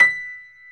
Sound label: B5 YAMAHA L, GRAND; NS GRAND